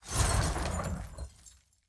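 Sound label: bullet hit building; apache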